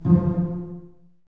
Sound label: BSS F 3 Pizz, String Ensembles Basses; Miroslav Vitous